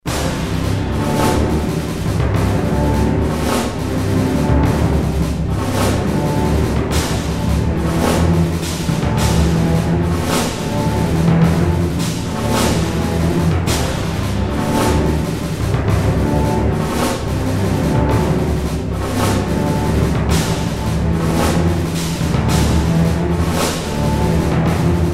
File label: Panic; bridgecommander